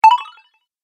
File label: Collect Magic Prize, video game sound effects